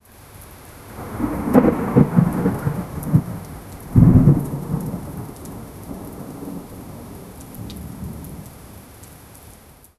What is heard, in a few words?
the sound of a thunderstorm